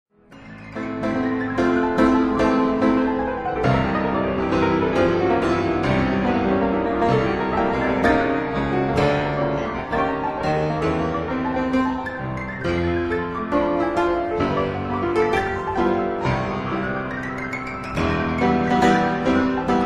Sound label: Etudes for piano in A minor